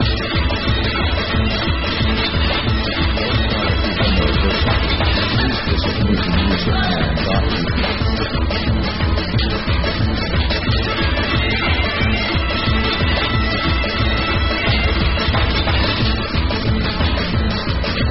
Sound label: gipsy house 180bpm